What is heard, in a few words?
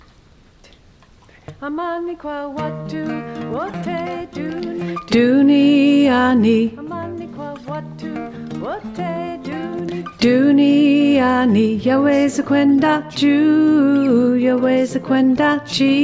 ALTO